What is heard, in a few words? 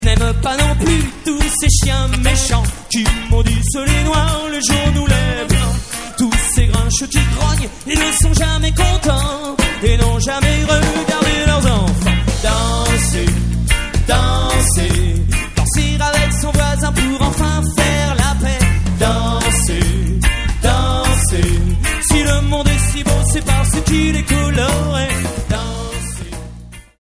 reggae